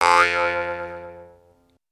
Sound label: Boing Sweetener